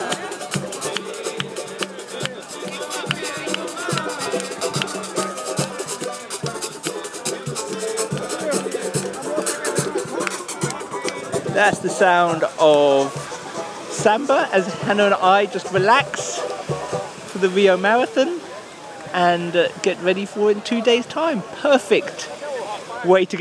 samba sun and running

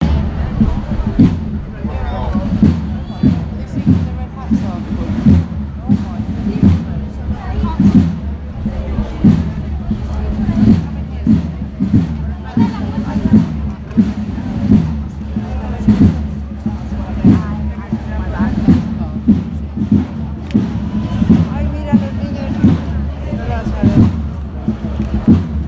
spanish easter procession

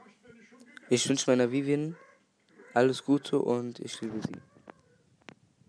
party

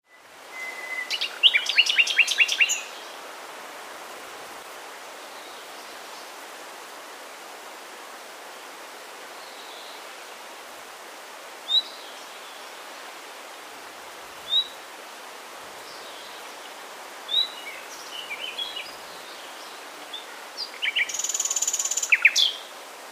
nightingale; hemley

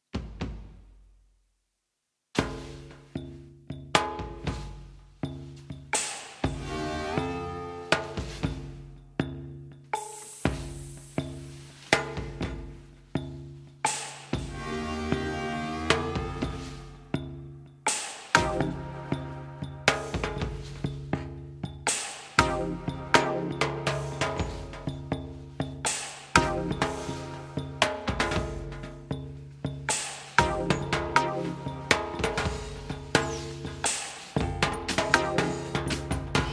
wavedrum test four tracks of drums; no cheating i m a shitty drummer

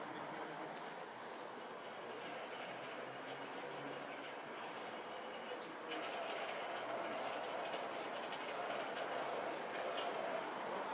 syon lane railway station

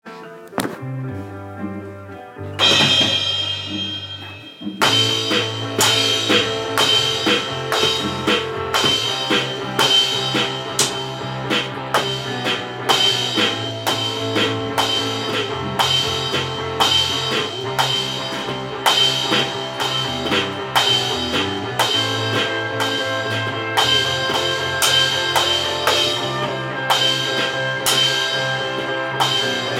drumming

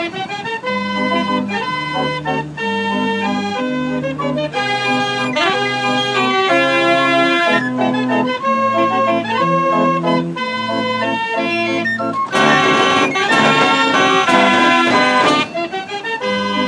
gavioli fair organ; otley fayre

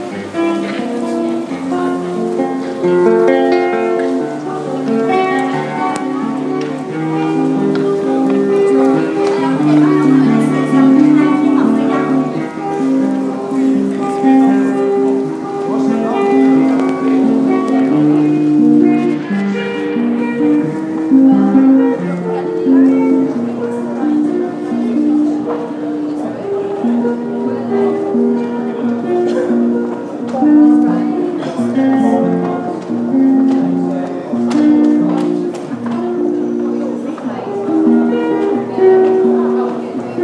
Spanish guitar busker at Leicester Square

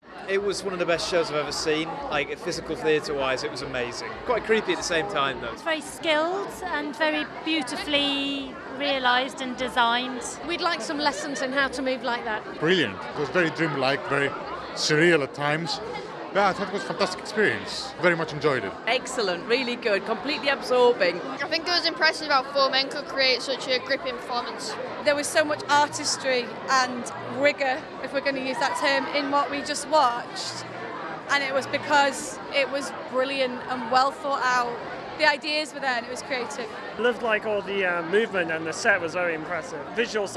audience reaction

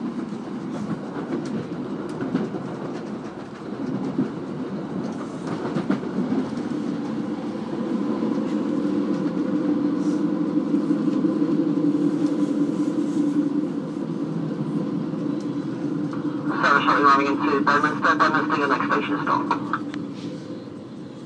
sounds of the train

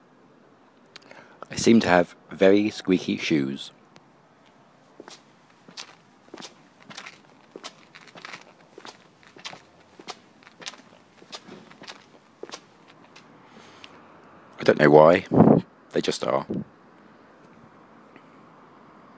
squeaky shoes

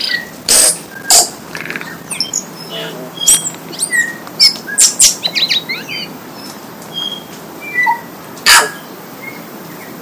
tui with bellbird korimako

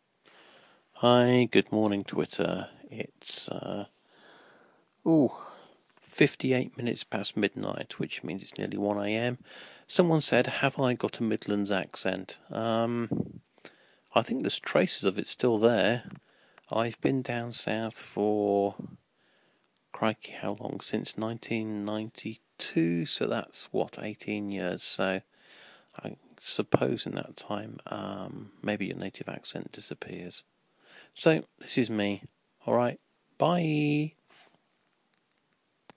yawwwn accent

